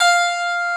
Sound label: KEYBOARDS, Roland LA Composer; Roland - LA Composer vol. 1